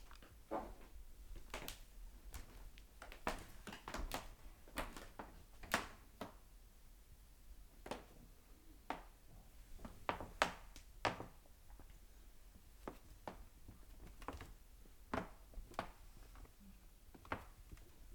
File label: Walking On Cracking Wooden Floor